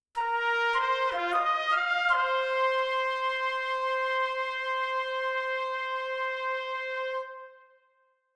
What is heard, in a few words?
Trumpet, Flute Oboe Stinger; Short